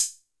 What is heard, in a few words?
Open and close hat